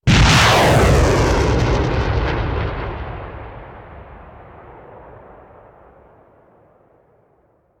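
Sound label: Artillery Missile Launcher; Sound Ideas; Guns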